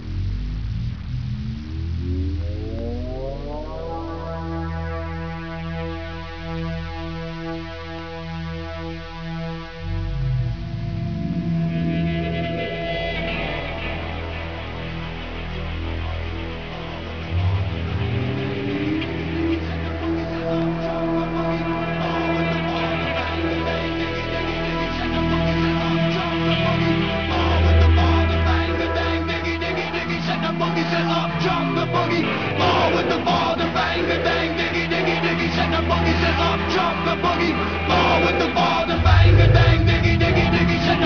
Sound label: rock